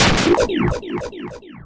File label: Barrier Destroyed